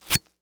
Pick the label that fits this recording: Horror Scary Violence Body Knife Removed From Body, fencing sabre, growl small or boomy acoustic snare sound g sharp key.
Horror Scary Violence Body Knife Removed From Body